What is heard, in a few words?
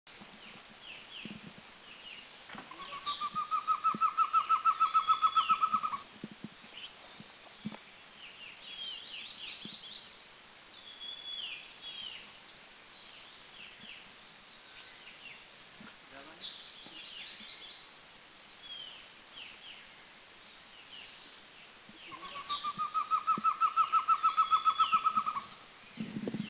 Wards Trogon Song, Dirang, India